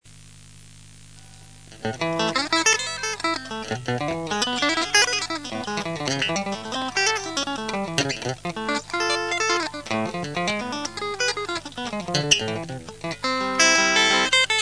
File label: Arpeggios, guitar